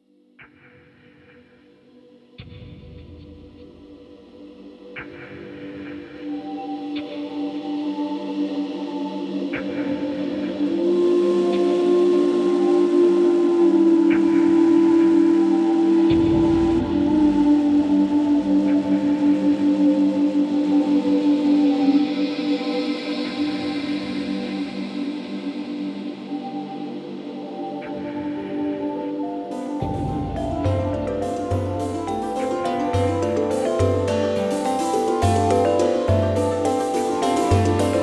Harvester Music

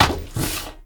Stone Turn

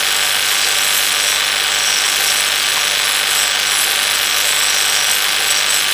Ring Small Loop; moul

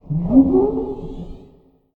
Sail

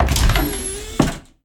Closet Open

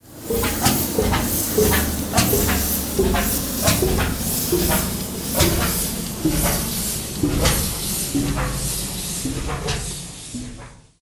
Harvester Dock

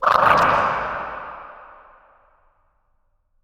Timid; moul; Bahro

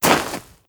Dirt Walk; moul-assets

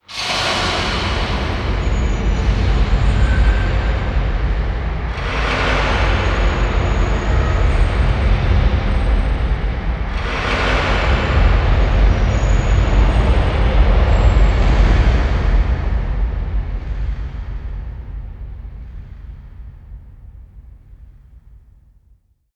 Pillar Reset Wet; moul

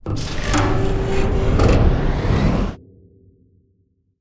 Fan Power On

